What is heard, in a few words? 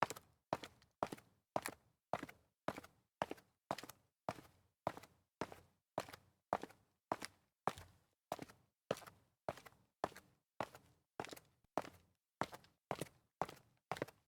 sneaker stairs walk concrete, foley